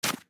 terre de pomme break; block

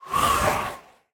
idle air, breeze; mob; minecraft

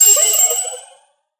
idle with item, allay; minecraft; mob

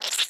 flop; toxifin

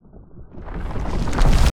sprout respawn; player

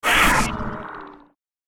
elder hit; minecraft; plaguewhale

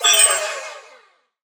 allay item taken; mob; minecraft

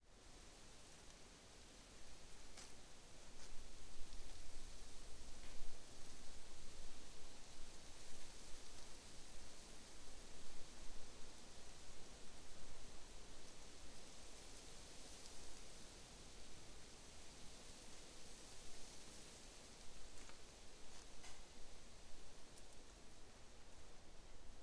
arboretum, ambient; minecraft

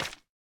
resin hit; block; minecraft

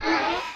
land idle; toxifin

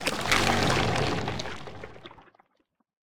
creaking death; minecraft; mob

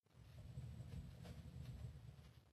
smoker smoke; minecraft; block

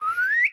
parrot idle; mob; minecraft

